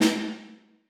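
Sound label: snare left hand mf; Percussion; Sonatina Symphonic Orchestra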